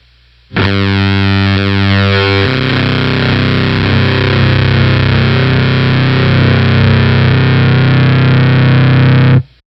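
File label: Fuzztest guitar preamp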